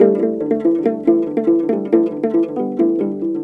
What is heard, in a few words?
AF HRP GRV, HARP PHR; Spectrasonics; Heart of Africa 2